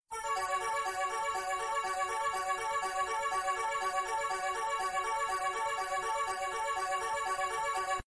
synthesizer

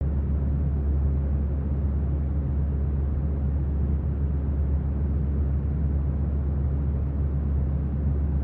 ceiling fan

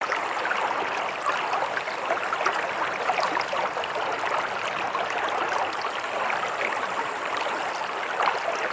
calm river

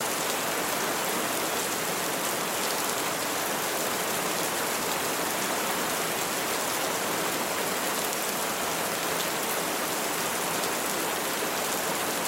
rainstorm

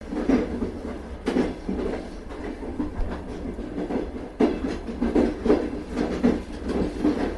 old train

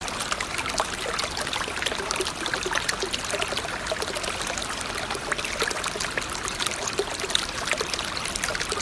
trickling river

